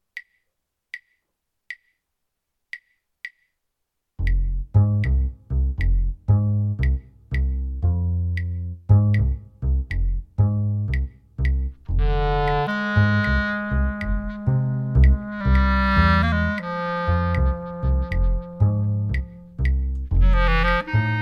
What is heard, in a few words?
clarinet and piano; La Comparsa